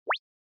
Anime Motion Single

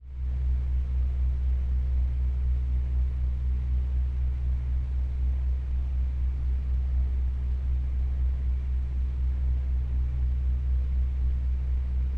Electric Fan Operating Noise

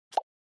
OS Sound Notification

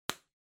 Bubble Wrap (Pop Close); 気泡緩衝材02-03(プチッ 近い); 効果音＞梱包材

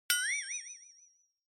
Flexatone

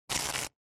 Horror Accent Short

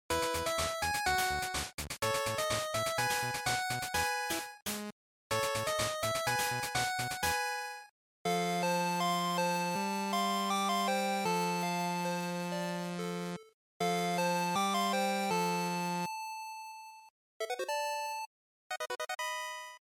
GB Shooter

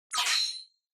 Onoma Syakiin Short; オノマトペ シャキーン; 短

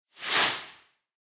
Noise Sweep Dry

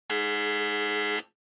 Electromagnetic Buzzer, Dry

